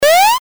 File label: jump